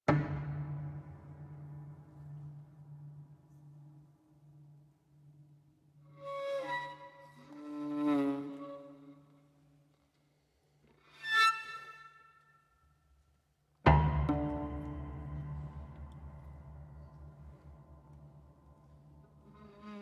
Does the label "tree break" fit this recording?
No